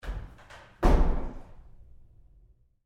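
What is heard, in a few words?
Door Bathroom Stall Open Roomy Med with Bounce; Door,Bathroom Stall,Open,Roomy,Med w-Bounce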